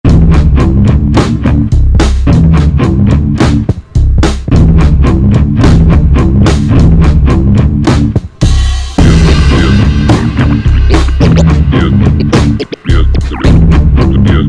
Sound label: Bad Loops Rising; Burntablism Volume 2